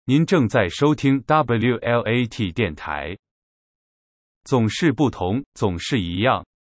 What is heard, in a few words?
chinese jingle